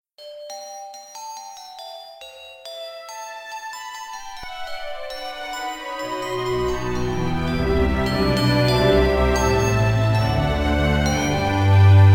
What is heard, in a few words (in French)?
musique